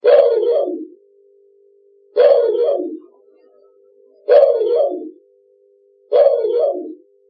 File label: Scanner